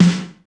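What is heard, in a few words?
snare drum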